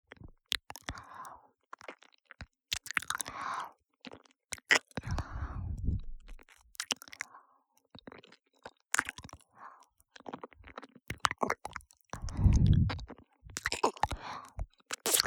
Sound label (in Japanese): 耳舐め 左, ループ音声